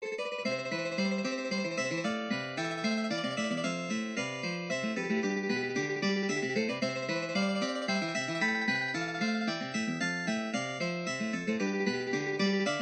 Classical Ringtone

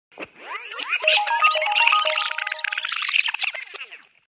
Dj Scratch Tone

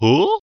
Banjo

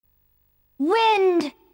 Wind; Talim

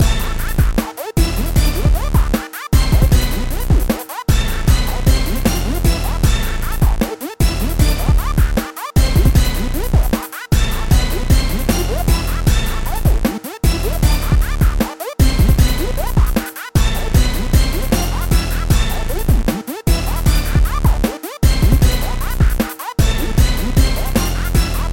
symphony rap beat; hip hop

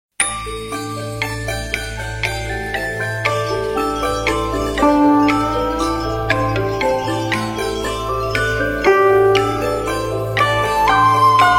chinese ringtone

